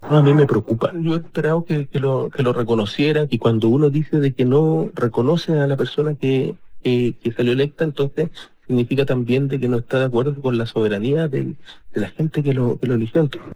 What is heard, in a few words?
vocals